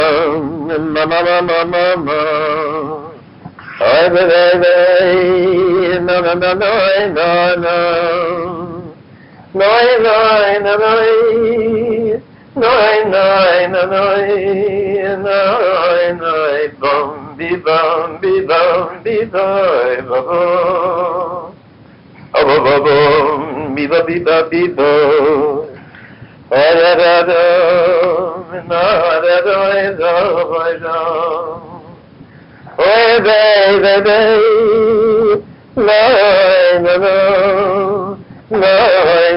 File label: niggun